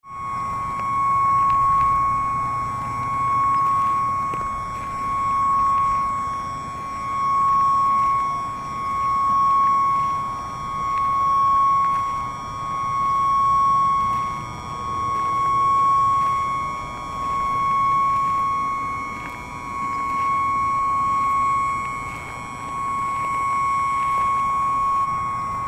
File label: flutes